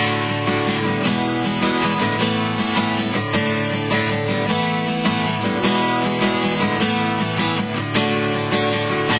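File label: Music Ambient; Saints Row 2; PS3 Windows Xbox 360 gamerip 2008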